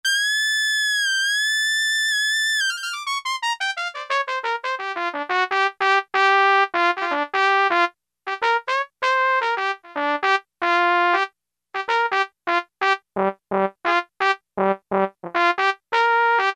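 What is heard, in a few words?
Soft Trumpet, Roland U-110; Factory Patch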